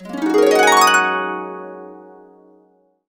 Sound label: Complete Harps; USB Soundscan vol.62; AKAI